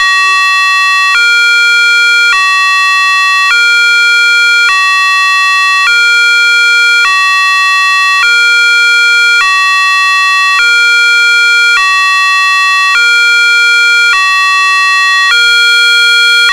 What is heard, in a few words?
special warning